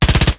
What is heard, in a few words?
vehicle